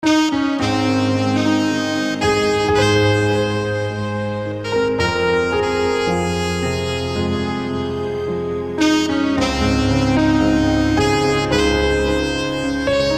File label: alto sax solo